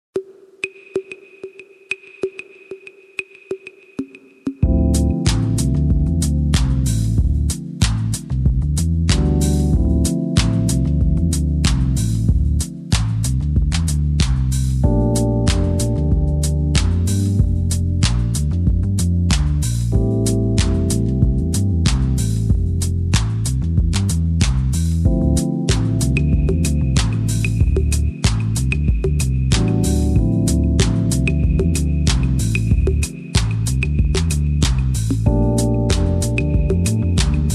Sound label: Chillout Vibes